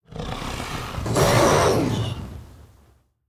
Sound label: Dragon Roar